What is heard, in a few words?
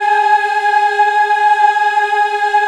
G#4, Choir; USB Soundscan vol.28; Acoustic & Synth; AKAI